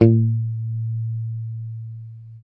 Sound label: B3 sus, Strat guitar; Spectrasonics; Hans Zimmer Guitars VOL-1; JC-STRAT MTE